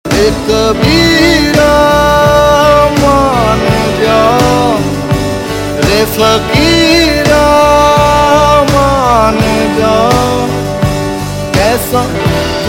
Male, Ringtone, Bollywood; Yeh Jawaani Hai Deewani

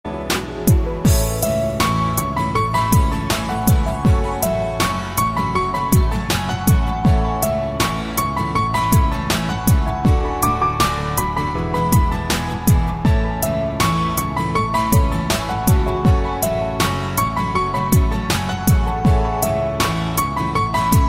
Instrumental Ringtone

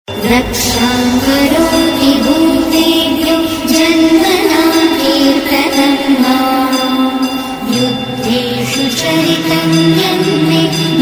Durga Bhajan; Siya Ke Ram

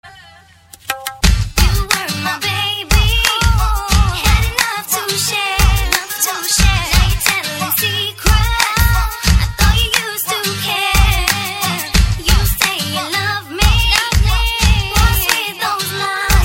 Rhythm Punjabi Ringtone

